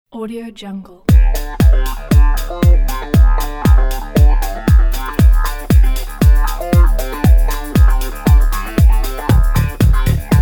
upbeat energetic miami sport funk

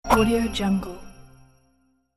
magical arrow flight

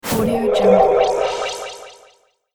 shadows vanish spell

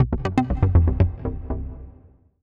melodic stinger floating loser; mobile game; esmteam

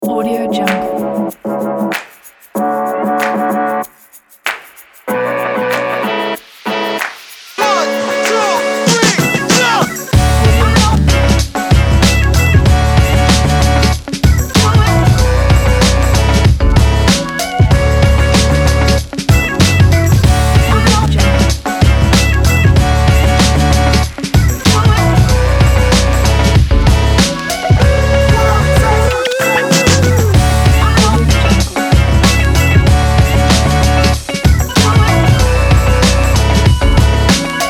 hip hop

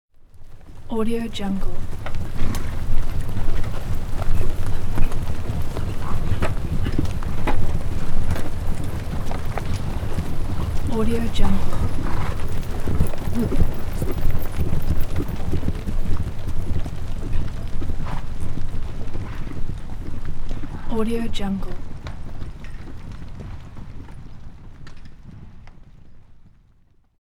single horse canter by on hard mud and grass; hollywoodedge

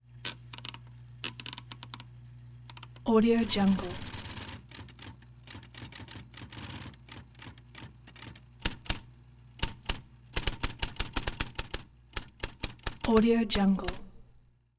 electric typewriter start motor run typing; prosoundfx

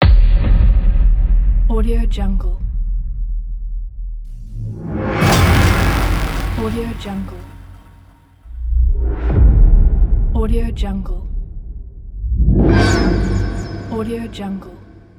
trailer hybrid hit sound pack

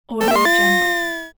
cartoon falling

